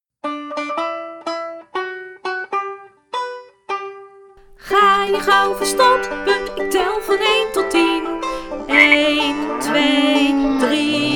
met zang

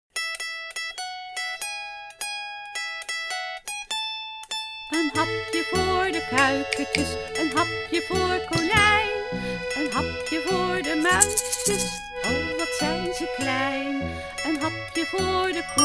met zang

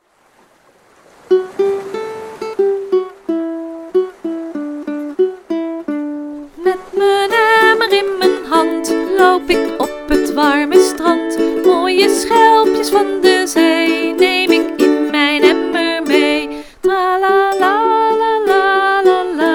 emmer met zee

